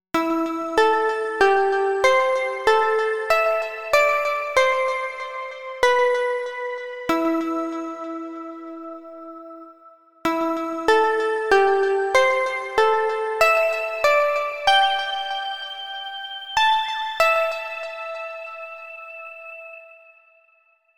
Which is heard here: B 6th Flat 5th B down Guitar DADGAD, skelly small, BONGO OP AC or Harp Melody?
Harp Melody